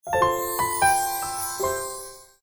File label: notification; Gionee; GN206